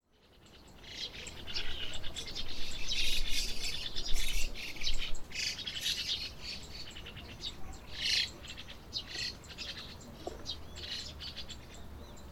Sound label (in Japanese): mukudori